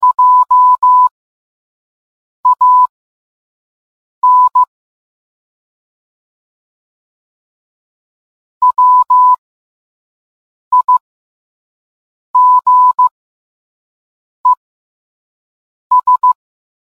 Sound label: morse; wigestrand jan